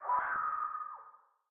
Scream Outside Ship; Lethal Company; Windows

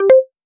Answer Phone

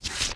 Rollover; Among Us

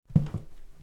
Step; hello neighbor pre-alpha alpha 1

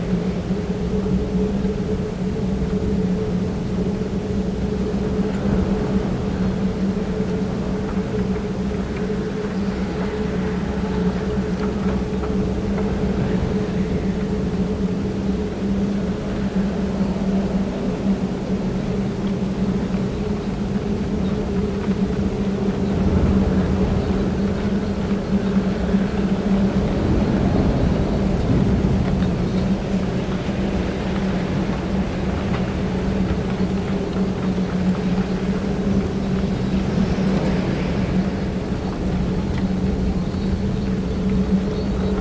Wind town; Dead Man's Hand; Windows; Xbox

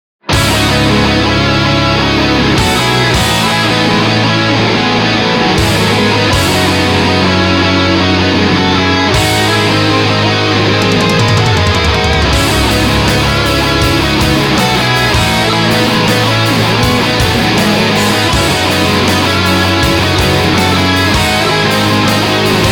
Instrumental; Black Cloak Skeleton

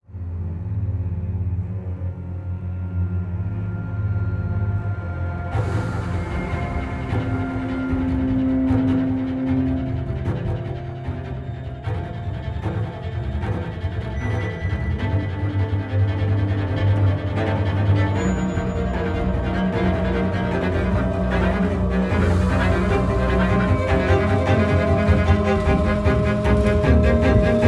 Linear Tense; the walking dead michonne

